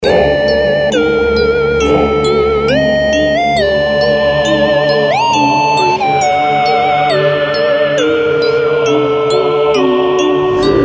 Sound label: Background Music